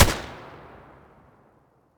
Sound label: M4A1 muzzle mono distant